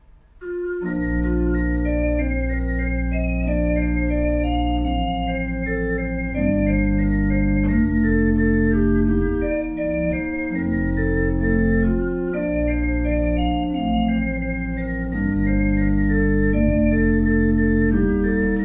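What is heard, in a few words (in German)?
Orgel, pfeifenorgeln; Kreienbrink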